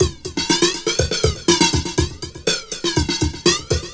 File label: Drum Fx Loop, Electronica; Drums & Percussion; FX Kits; Sonic Foundry (Sony Creative Software) - Electronic Point Blank